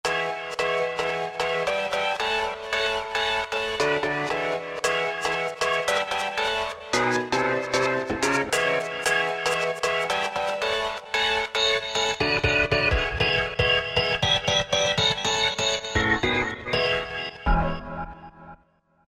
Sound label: Loop Techno; Roland SRX-05 Supreme Dance